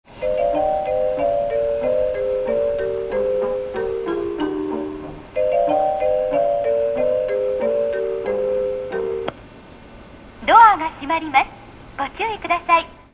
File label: melody